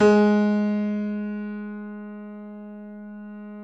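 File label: keys G#2 F, YC7 piano; Rhythm Section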